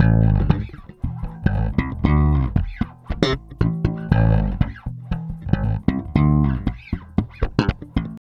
Bass, RnB

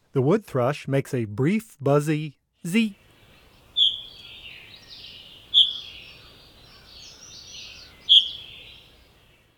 Wood Thrush Flight Call; North America; Common Western Species; Know Your Bird Sounds

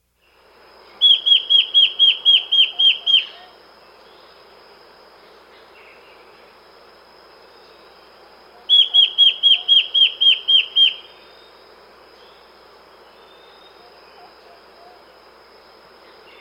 Immaculate Antbird; S. America

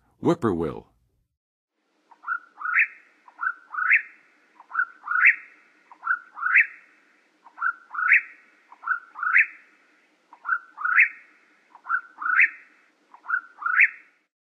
Whip-poor-will, Songs of Birds of North America; Western USA; Western USA Songbirds

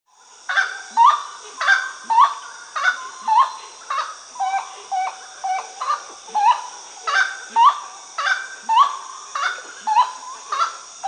Gray-necked Wood-Rail (Aramides cajanea), Peru; S. America

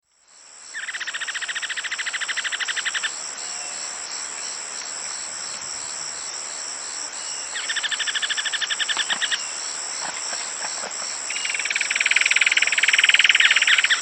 Rusty-Backed Antwren, S. America

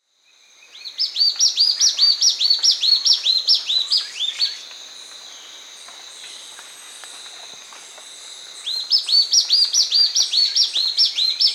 Spot-backed Antbird (Hylophylax naevia), Peru; S. America

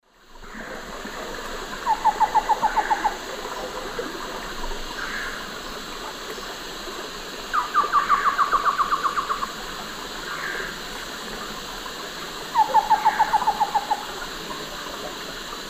Hylopezus fulviventris, duet; S. America; Eastern Lowlands

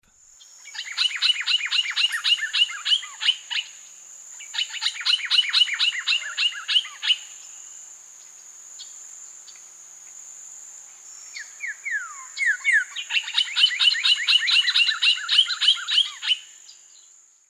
Saltator coerulescens, alarm song; S. America; Eastern Lowlands

